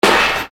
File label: Percussion, EuroDance